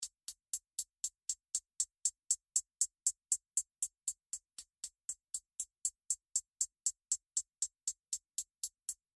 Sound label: HiHat